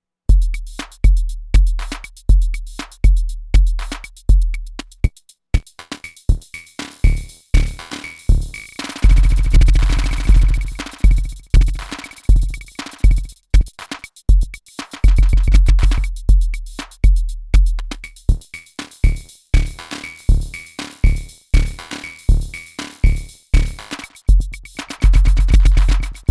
drums stutter on electro beat

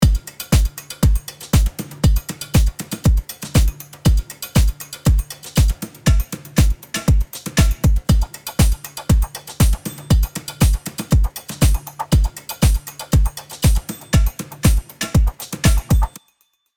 Full Finished Beat

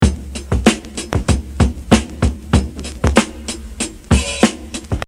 95bpm Unquantized

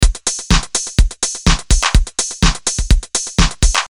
Beat Dissected Chicago

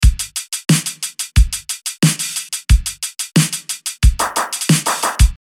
bd synthwave drums

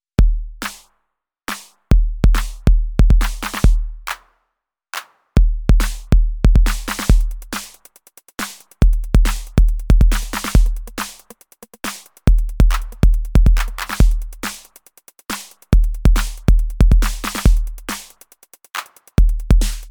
fader chops

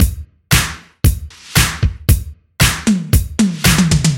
80s Post Disco

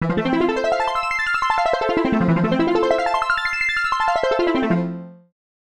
Dry Arps

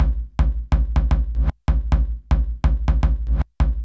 big house drums percussion only

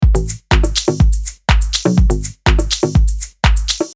Syncopated Beat Bass

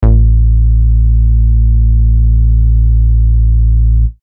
Bass Original

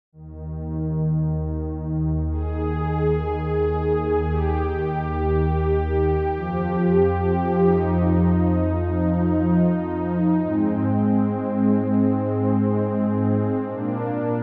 Pad Shop

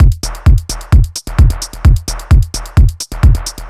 BD Analogue

